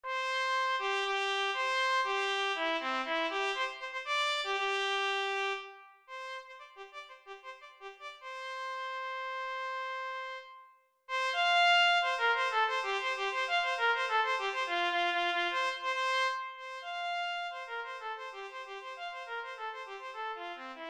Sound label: Trumpet call